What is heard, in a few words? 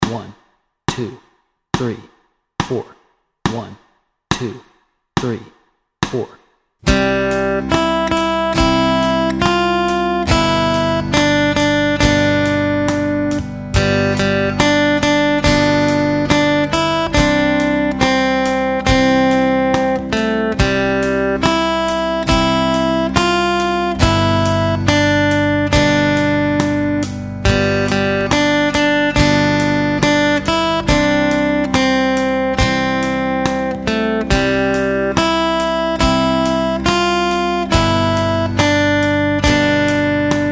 Hush Little Baby Melody and Chords Track, guitar